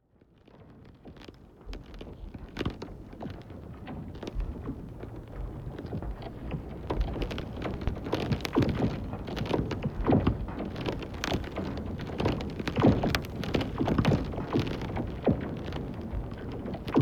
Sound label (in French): Glace, the sound of snow and ice